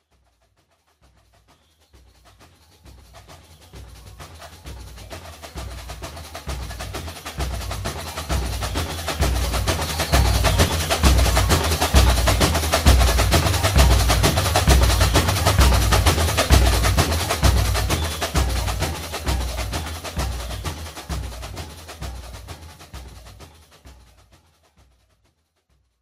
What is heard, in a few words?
Fanfarra